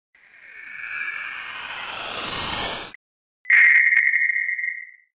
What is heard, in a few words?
meteor impact